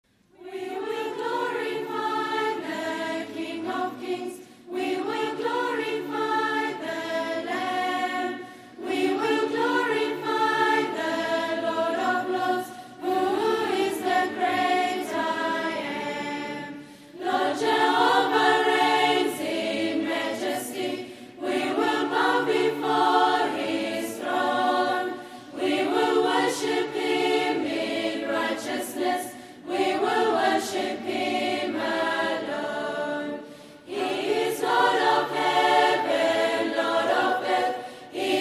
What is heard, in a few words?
youth choir